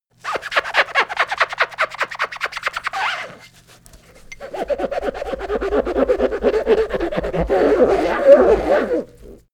sponge squeak fast

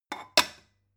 stone bowl shelve short

